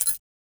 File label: key pickup; svencoop; twhltower